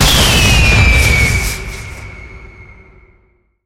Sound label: firewerks launch; atmosphere